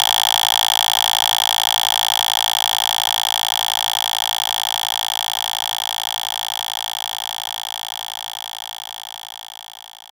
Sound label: TR 808 Click